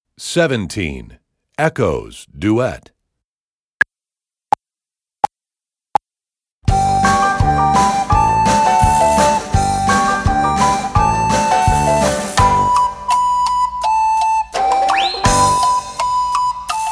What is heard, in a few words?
Echoes Duet, Recorder